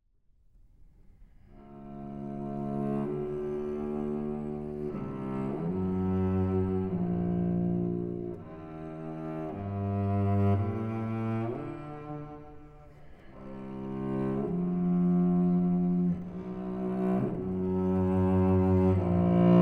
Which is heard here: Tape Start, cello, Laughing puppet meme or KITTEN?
cello